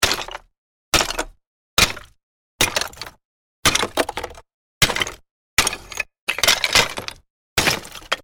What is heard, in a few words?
Pot Smashes, Smashing of clay plant pots.; Objects